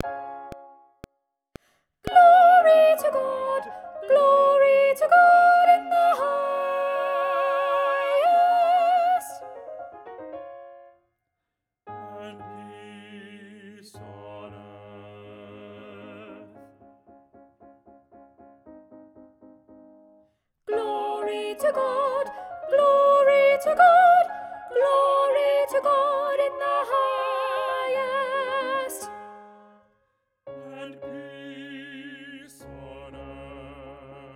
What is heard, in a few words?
Glory To God SOPRANO